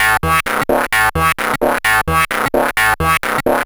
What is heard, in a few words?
MiniBrute Loop, 130BPM